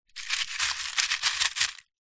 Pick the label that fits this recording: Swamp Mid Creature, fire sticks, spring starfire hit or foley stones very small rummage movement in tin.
foley stones very small rummage movement in tin